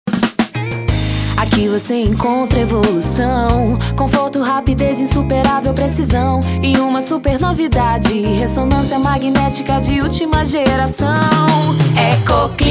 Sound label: Jingle; Tomoclinica